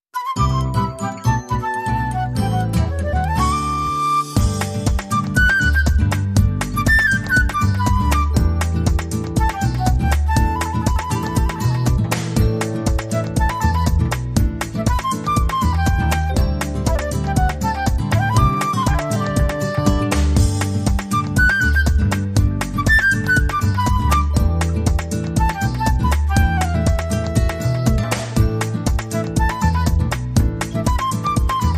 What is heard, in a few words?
Birds Chirping